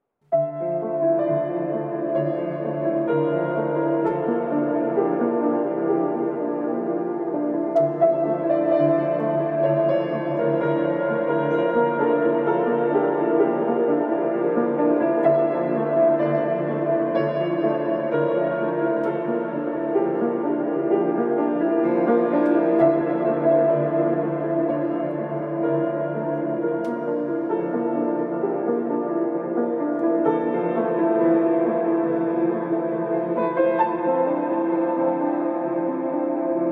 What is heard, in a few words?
Piano